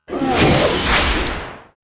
T Destroyer attack

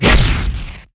Plasmagun Metal, Damage

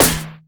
Staff Metal, Damage

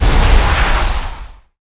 Ceramic Damage; bullet

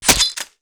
Axe Shell, Damage

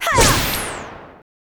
Knights attack

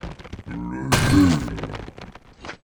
BLAZE BLOCK PEACE IDLE

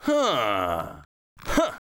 high elf night war idle

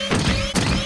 WAR WALK; Mob

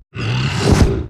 Rust Attack

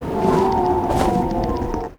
RECRUIT STAND; BARAS; Mob

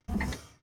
Walk

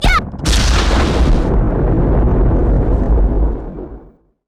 attack; Candra; Mob

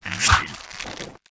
WAR ATTACK

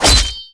Sword Skin, Damage

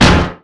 Gun Metal, Damage

